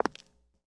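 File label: Foot Concrete Shoes Walk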